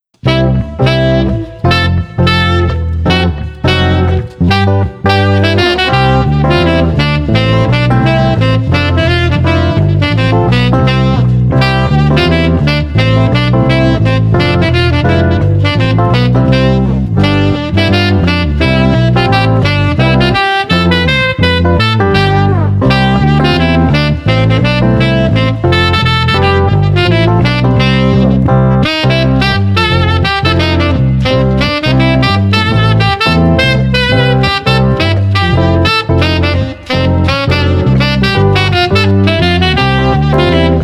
Deck the Halls Jazz no Drums 170

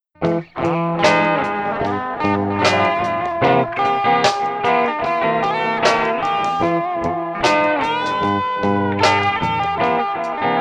Street Trio Gritty Blues

